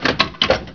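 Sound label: grenade cock, weapons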